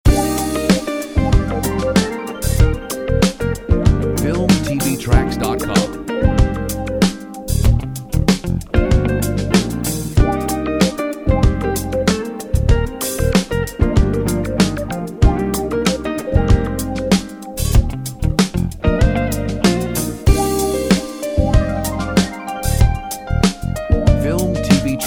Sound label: Trippin rhythm